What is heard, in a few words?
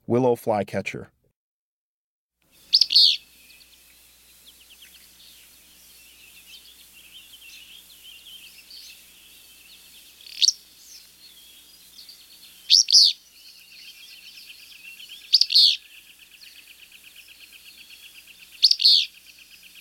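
Willow Flycatcher, Breeding Birds of the Chicago Region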